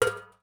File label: ui drop metal thin hollow; Rust